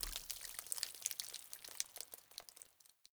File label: Water Shallow Exit Drips Dirt; Player; Entity Contact Movement